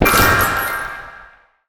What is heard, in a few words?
Blue Mage Attack Impact; Android; Portal Quest